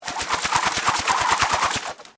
Grapple Gun Rope, Grapple, Gun, Rope, Spool, Fast, Device; Warfare; Combat